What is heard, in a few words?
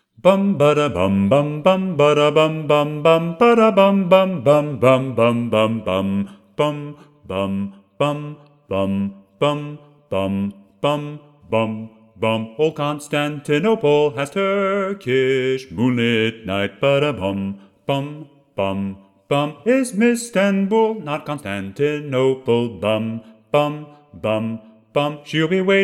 Bass solo